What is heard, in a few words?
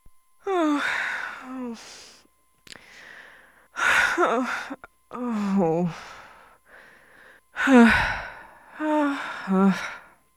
people